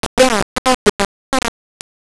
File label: paper jam